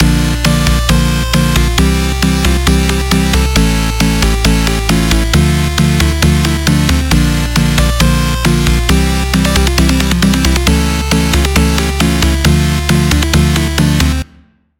Key; Routed Sound; Sylenth1 Expansion - Hyper Gamer Vol.1

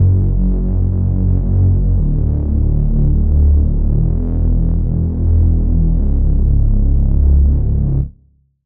Reese

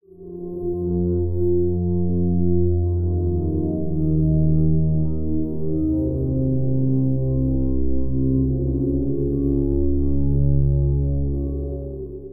Pad; Routed Sound; Sylenth1 Expansion - Reality Maker Vol.1

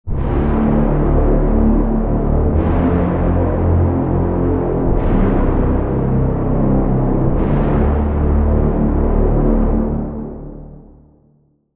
Lead - Warhorn; Routed Sound; Vital Expansion - Quantum Enforcer Vol.1

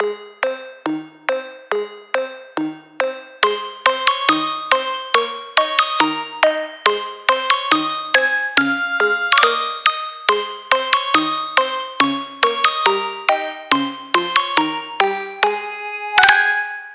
Key; old way; Routed Sound; Sylenth1 Expansion - Hyper Gamer Vol.1

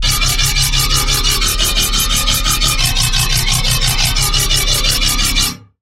Bass - Evil Metal; Routed Sound; Serum 2 Expansion - Chaos Bringer Vol.1

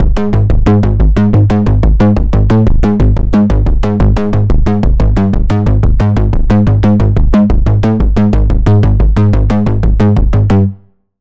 Pulse, Sylenth1; Routed Sound; Sylenth1 Expansion - Quantum Enforcer Vol.1

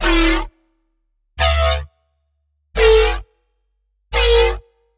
Wobble; Wobble - Dilapidated; Routed Sound; Serum 2 Expansion - Chaos Bringer Vol.1

